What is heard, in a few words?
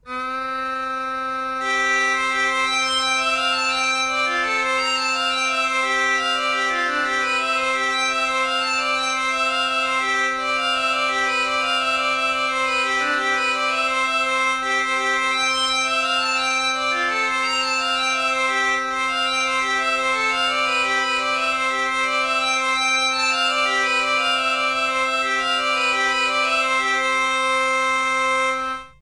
pipes; Musician